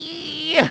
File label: barnacle chew; mario; supermariobros